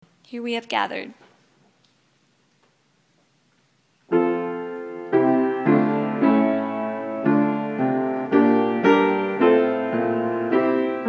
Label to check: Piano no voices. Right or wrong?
Right